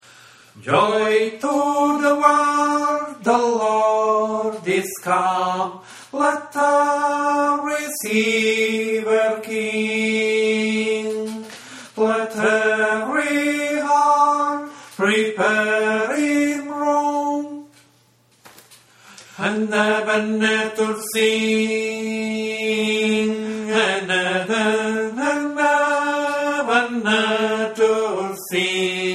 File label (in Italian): tenori; Joy to the world